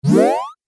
8 Bit Style Jump; Video Game Sound Effects

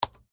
Laptop Type Single Key; Enter Hard; Laptop Computer; Technology; Computers; Blastwave

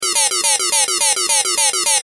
Video Game Beeps; Vintage Beep Chime; Games; Technology; Blastwave

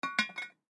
Teapot Close Lid, Teapot Close Lid Antique Tea; Tea Kettle; Household; Dishware

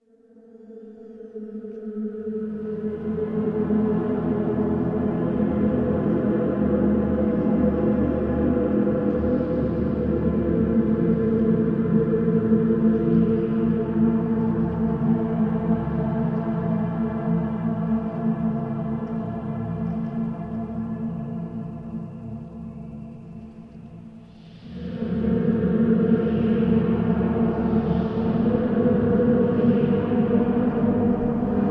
Choir at end of hymn in large cathedral; recorded long shot; Singing; Tom Hutchings

